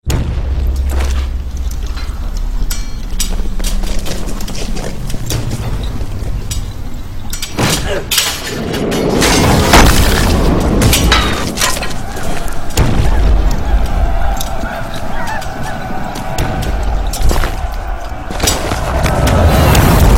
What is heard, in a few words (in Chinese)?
深度音乐音效; 为了荣耀